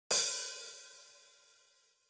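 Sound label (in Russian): удар по барабанным тарелкам один раз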